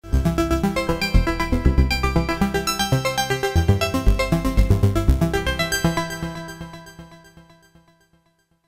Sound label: micro exploration, keyboards